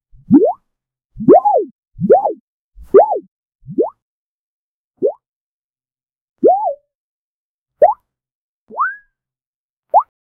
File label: a comical boing sound as a character jumps into the air; cartoonish